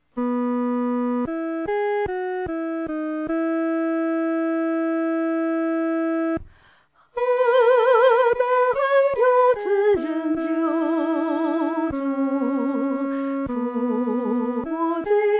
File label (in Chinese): 独唱第一声部; 何等恩友